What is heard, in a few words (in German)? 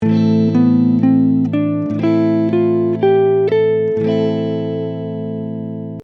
Aeolisch; A Aeolian with A Minor Chord